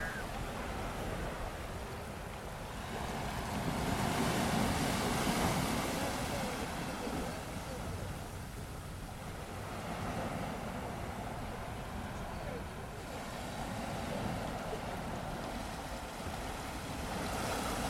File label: sea